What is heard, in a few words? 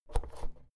oven knob press in hard